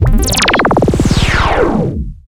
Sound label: Patience; Fly For Insanity